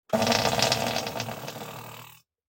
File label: Fart Noise; SoundsCrate